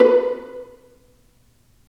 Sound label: STR P, Roland String Master Series, Vlas Pizz